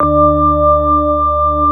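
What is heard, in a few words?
CX3 organ; USB Soundscan vol.09 - Keyboards Old School; AKAI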